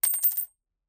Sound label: Coin Drop Concrete Floor